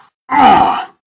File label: zero med pain